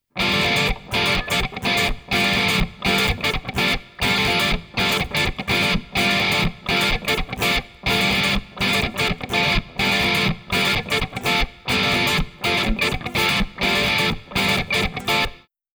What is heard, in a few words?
Rhythm